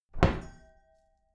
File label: wooden door close heavy glass clang